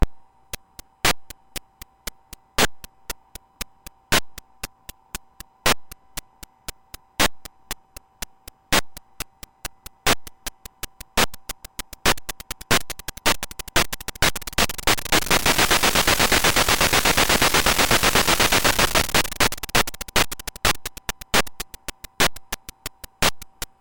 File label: rhythm